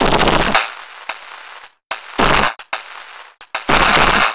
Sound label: Noise Beat; Drums & Drones